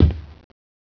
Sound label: metal